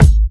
sharp kick drum single shot g sharp key

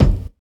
tight lows bass drum sound D# key; kick

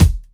airy kick drum sound d sharp; D sharp key

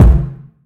short reverb steel kick drum c key

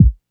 boom steel kick drum a sharp key; Duration 0:01; Mono; kick drum samples

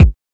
subtle reverb kick single hit e key; kick drum samples

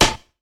shiny steel snare drum A key; snare-drum-samples

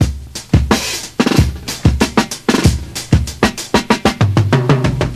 93 bpm drum loop c sharp key

